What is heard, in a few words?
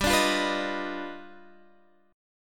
G 7th Flat 13th; G7b13 strummed; JGuitar